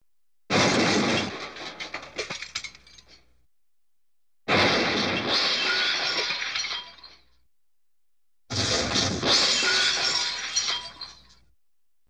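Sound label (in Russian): грохот стекло разбивают вдребезги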